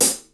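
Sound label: hi-hat pedal; 300 Drum Machines; Yamaha Motif ES-Series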